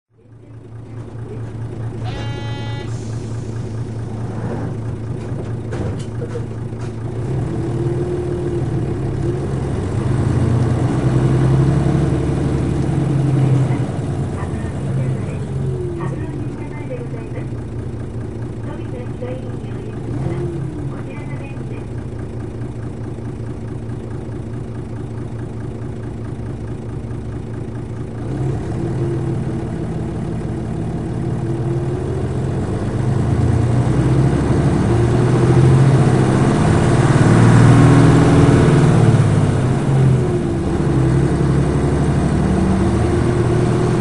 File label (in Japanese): 走行音, いすゞ P-LV214M; 仙台市交通局; 川 内; 輪王寺前→羽黒神社前